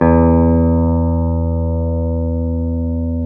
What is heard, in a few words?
GUI SUS E 1, E-Guitar; E-GUITARS